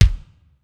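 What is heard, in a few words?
ambient kick; sr16 bd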